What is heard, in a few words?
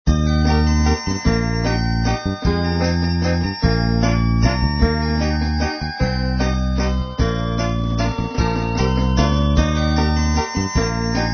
Christmas Song, moods